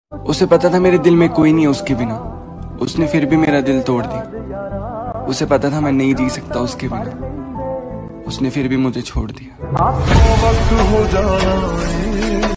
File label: very sad song status broken heart breakup song hindi